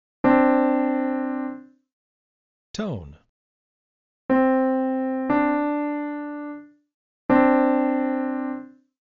tone example

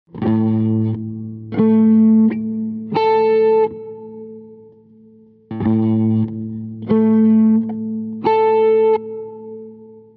guitar

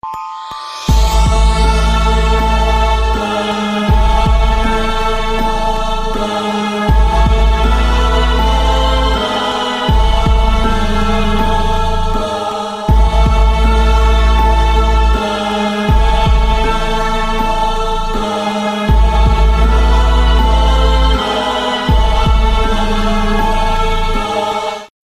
Bass and choir

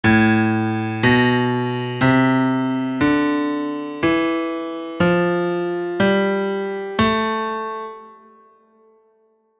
A natural minor

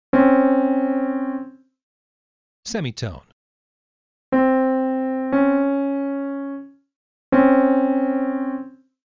Semitone Example

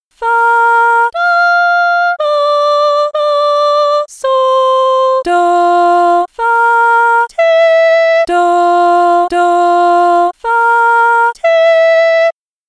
Hard Melody Solfa

